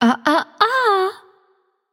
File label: disagree light; smite; voicepack; hel